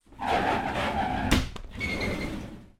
household shower cubical door slide open then close